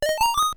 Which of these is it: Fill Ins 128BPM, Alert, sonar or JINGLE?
Alert